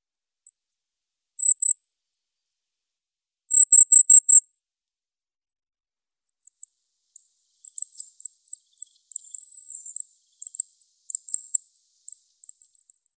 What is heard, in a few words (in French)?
Roitelet huppé, cris stridents, vibrants, saccadés; ATLAS NICHEURS BRETONS 2004-2008